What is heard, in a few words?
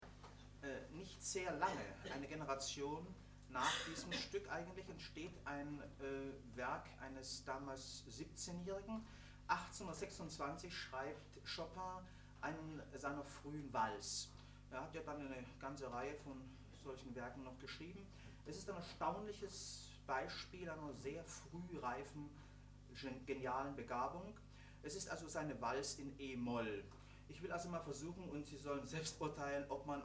Valse e Moll; Moderation